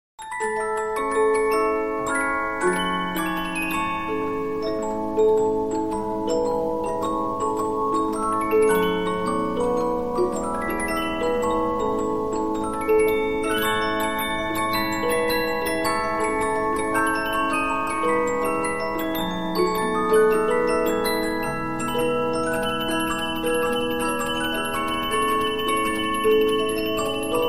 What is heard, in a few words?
Polyphon, 15inch disc musicbox